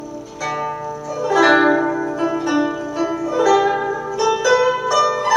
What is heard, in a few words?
music